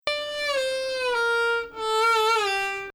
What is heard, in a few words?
Nahawand; Bayati Samai